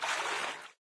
wade, footsteps, survivor, run

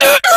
death, infected

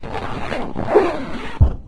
sports empty recycle bin; windows 9x; sound schemes

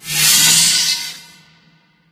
dropper iris open; Source Engine; Portal 2

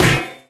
physics turret impact md

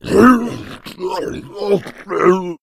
boomer shoved, hit

